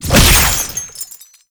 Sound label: Chain Latch; Roathe Summon Catenach; Warframe; Tau